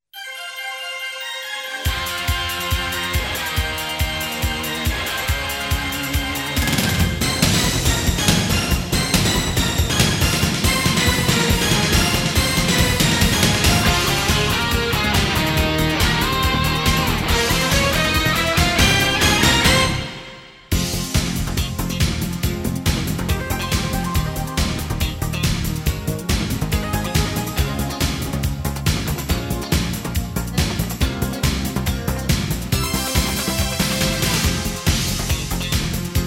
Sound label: karaoke; SeraMyu